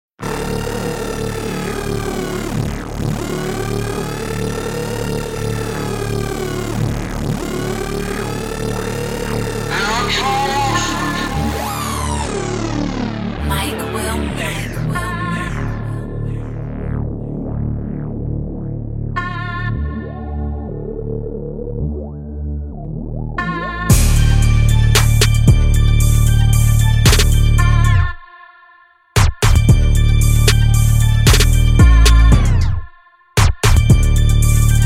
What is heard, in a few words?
Interlude Instrumental